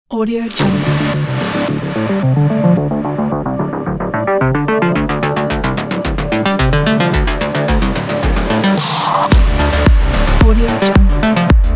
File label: sport techno